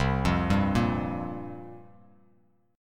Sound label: C 7th Suspended 4th, Bass Standard; C7sus4 arpeggiated